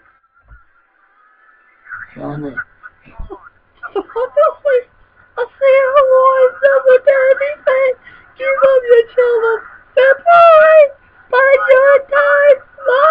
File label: me singing theme song